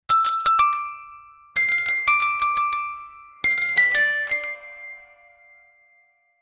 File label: button emergency